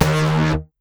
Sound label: error